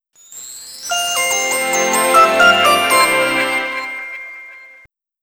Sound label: shutdown